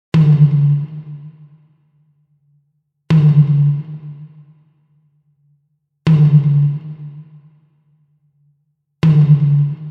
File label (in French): Tambour, Tambour isolé, tambour tribal; Tambour brésilien; Panoramique à droite.